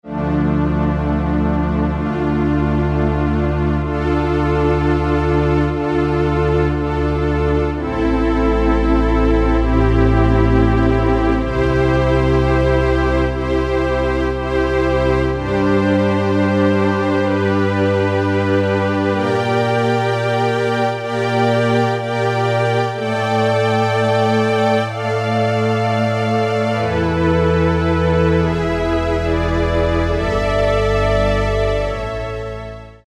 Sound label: JP STRINGS, Roland Guitar And Keyboards